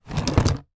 foley stones pebbles movement tip in small cardboard box